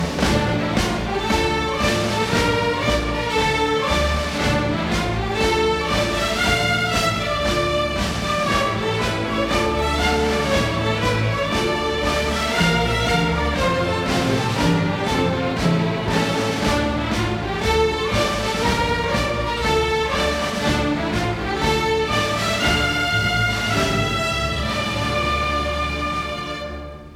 Scottish Fiddle Orchestra

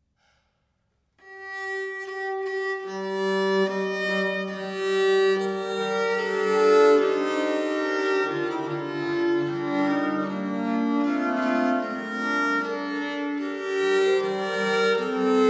La Gamba, Fantasia; Bassano Giovanni

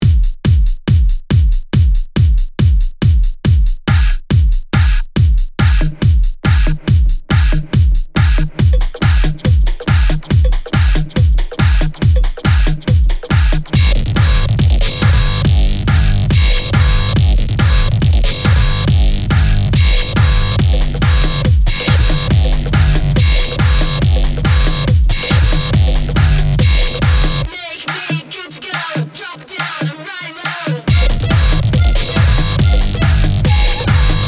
Techno

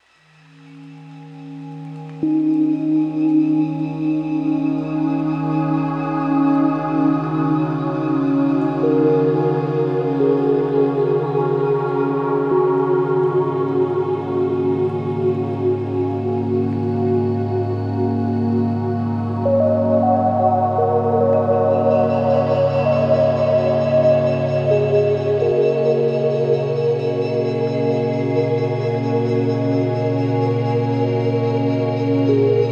Delta Binaural 432 Hz Meer Binaural Beats; Deep Sleep Music; Entspannungsmusik

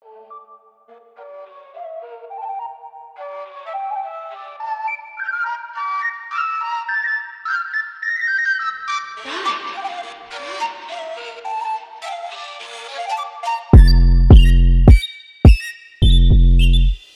flute minimal trap beats

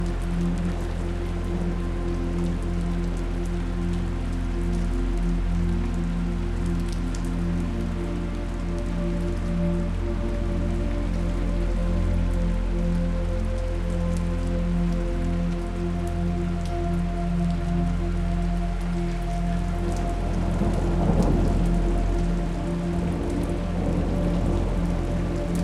Thunder Storms Rain Sounds, Rain

